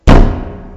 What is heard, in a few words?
Knock